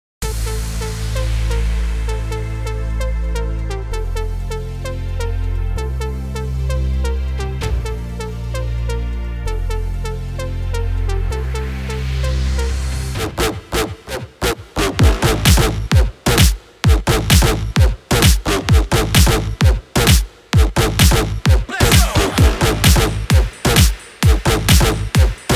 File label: edm; סט מקצבים לקורג